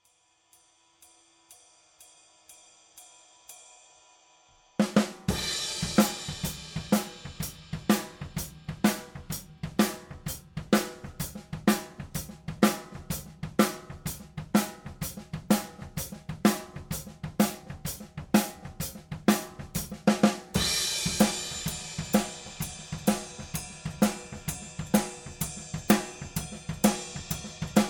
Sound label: Overheads solo